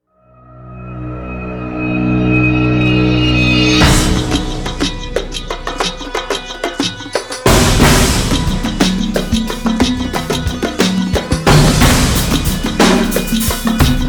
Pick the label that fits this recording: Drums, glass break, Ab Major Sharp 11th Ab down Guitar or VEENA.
Drums